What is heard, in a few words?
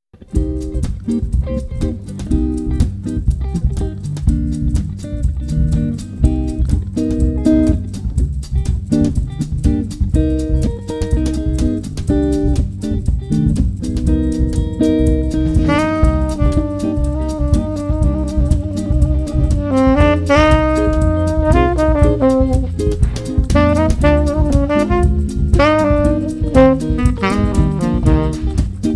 Samba Kabassa Quartet Version